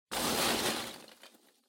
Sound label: Loose Fill Packaging, Rustle; 梱包材